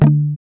GUI D, GUIT2 AC; Best Service Gigapack 2

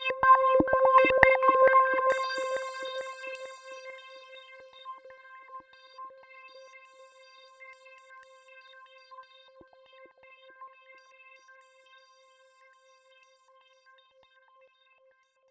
C4, SynthLine; 90 s Sample CDs; NiceBeats; SynthLine VOL-10

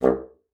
bassoon, C2; Xsample VOL-6

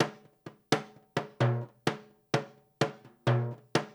LOOP, DANZON, Percussion; InVision Interactive; Percussion Slam

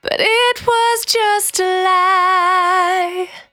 Cry 68bpm B, Vocal; Zero-G

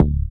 BAS, Dance Bass; Sound & Vision - Gigapack II CD 1

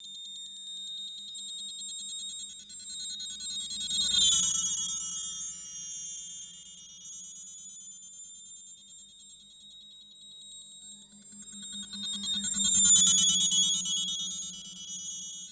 TINNITUS, Anime Sound FX; FLYOBJECTS

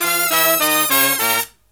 SLEEP, Killer Brass Riffs

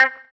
VIBRA WAH, guitar; Spectrasonics; Bizarre Guitar

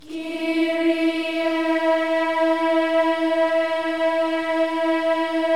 KYRIE, Symphony of Voices; Spectrasonics; BC KYRIE

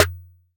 snare G, hip-hop drum kit; 99Sounds x Monosounds; Moon

